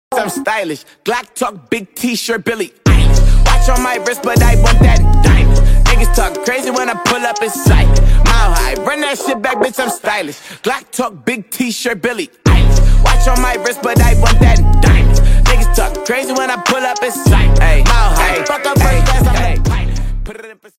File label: Trending fitness ringtone